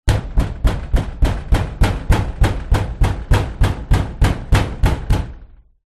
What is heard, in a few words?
impacts metal wall fist hit multiple times hard; zapsplat